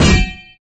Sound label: Parry